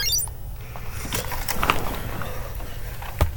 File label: Moving Through Menus; game box plus